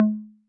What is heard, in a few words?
Conga Low 808 Sat